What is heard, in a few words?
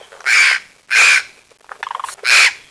jays, calocitta formosa; bosque seco